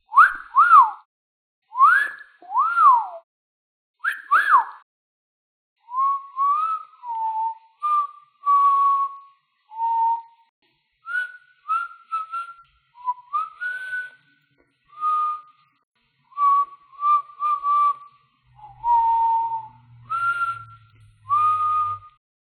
Whistle Person

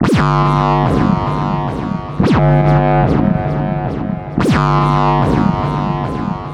Hardstyle

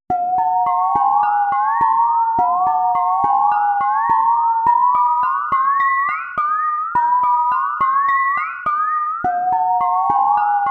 Water In Metal Pan Music

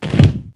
Bundle Falling To Ground

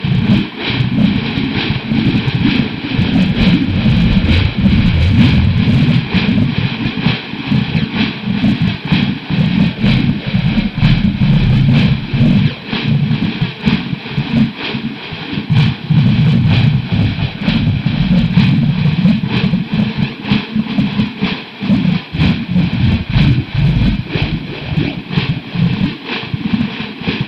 Slow Wind Turbine

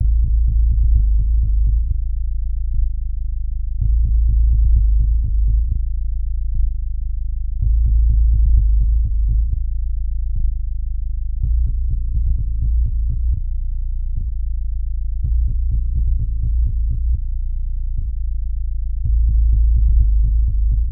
Bass A

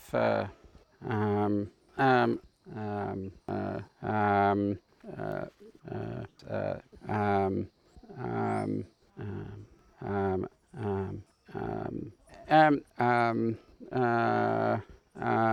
Ummmmm

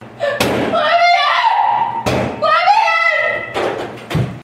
Banging On Door And Screaming

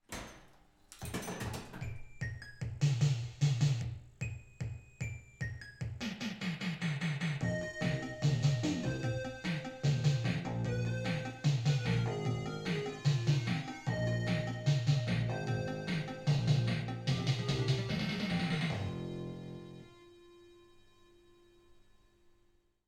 Arcade Pinball Machine Theme Song

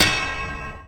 Epic Sword Clash

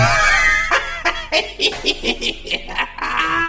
Laughing Joker

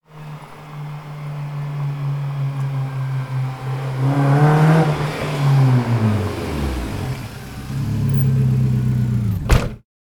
Rapid Car Arrival

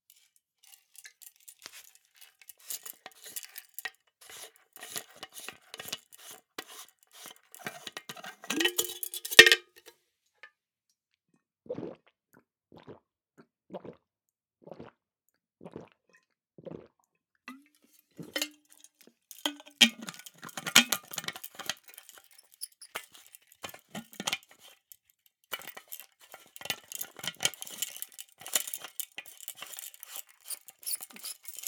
Bottle drinking

